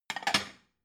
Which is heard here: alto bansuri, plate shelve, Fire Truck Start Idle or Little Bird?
plate shelve